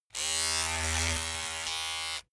razor on load short; maschinen; rasierer; soundpool

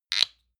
lotion lid open hard; friseur; berufe

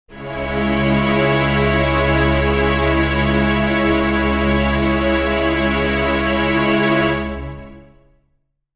string chord c sharp minor

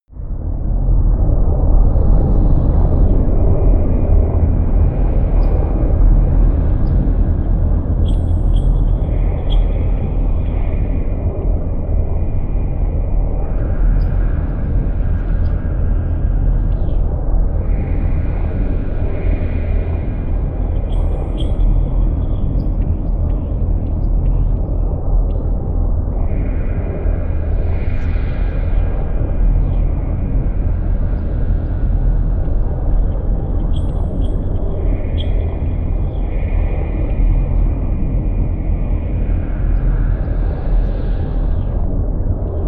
drone storm low

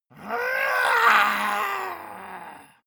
monster growl high; schreie

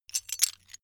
metal pieces clatter short

